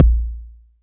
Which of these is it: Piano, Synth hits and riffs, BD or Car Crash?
BD